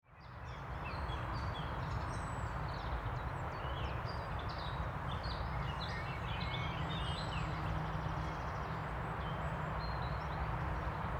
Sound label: garches